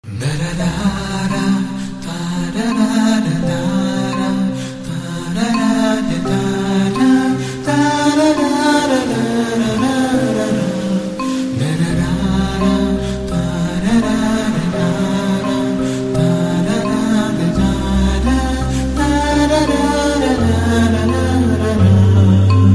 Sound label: love bgm love ringtone; surya